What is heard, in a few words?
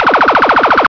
laser